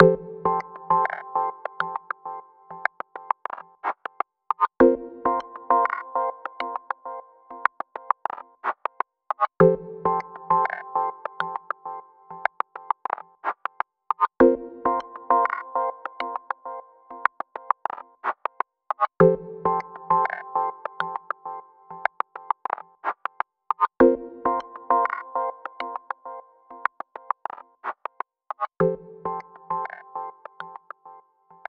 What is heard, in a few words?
BGM Menu Online Match; Clubhouse Games: 51 Worldwide Classics